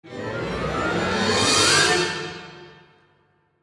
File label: clumsy orchestra